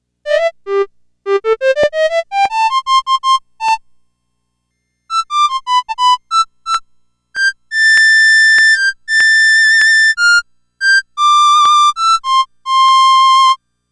Recorder

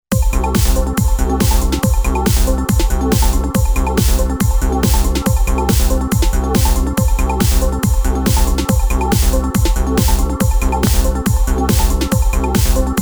Shifter

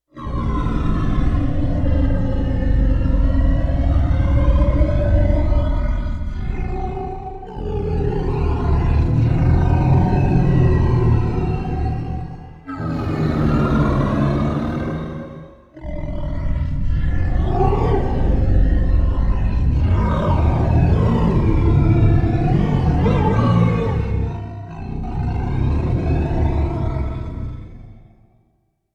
Jurassic Breath, Roland VC-1

